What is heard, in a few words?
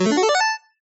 Ball, Skee-Ball; TheStable; Pyralix